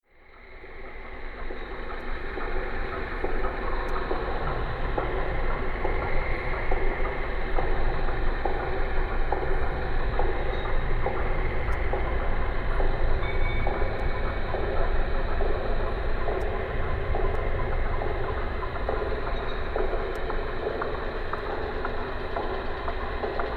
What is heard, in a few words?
sonar systems brueckenmusik; koeln; klaus osterwald